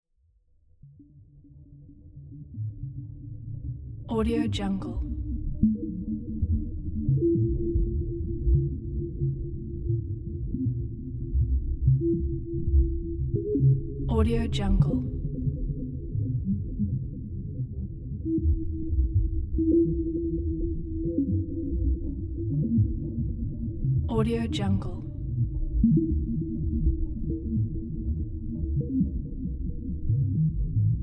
underwater scifi laboratory ambience; mountainaudio

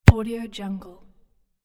single soccer kick

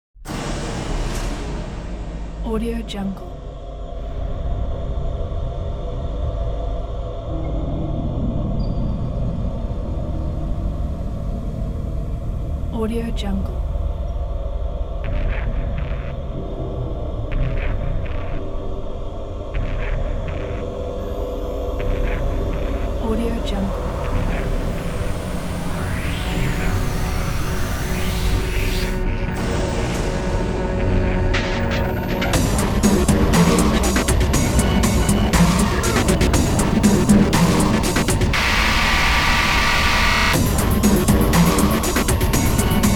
suspenseful trailer

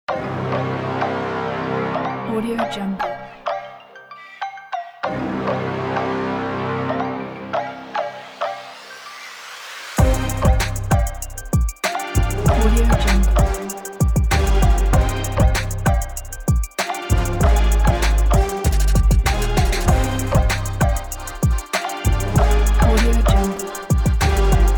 epic hip hop promo

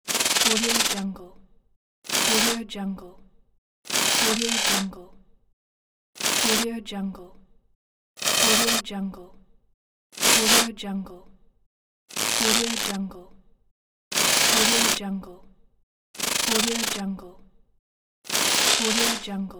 digital motion elements pack

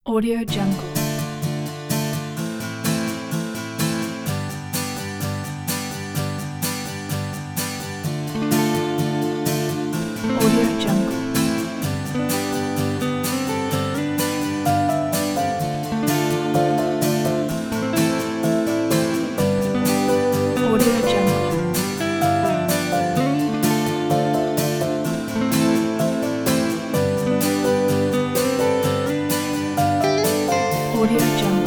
ballad for the sun

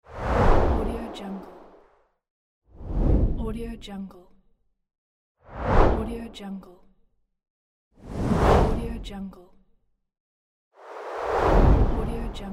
woosh pack

